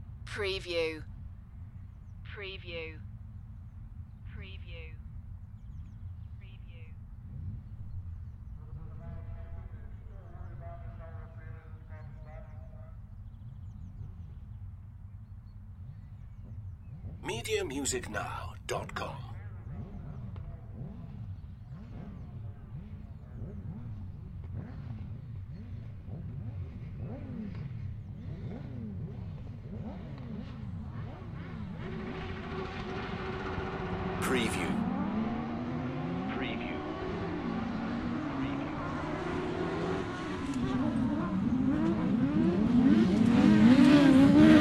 Dirt track car racing UK ambience from a spectators position; beanstalk audio